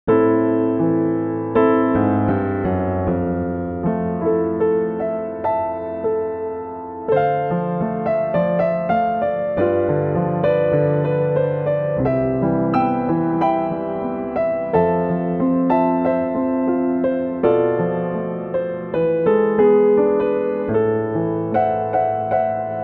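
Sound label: A Moment with Bluethner, Pianoteq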